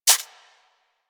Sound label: Rodent Step Dirt; Characters; Foley; Warframe